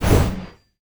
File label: sfx skill, ZombieSkill SFX; IdleRPG2024